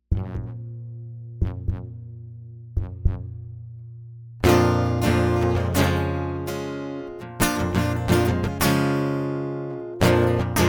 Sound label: acoustic